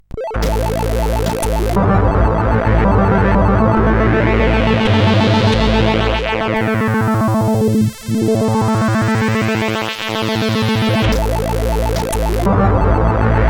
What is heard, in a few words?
Menu, CSG8580; CSG8580R5